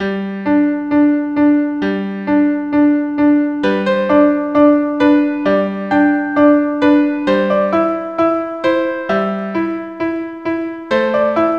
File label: piano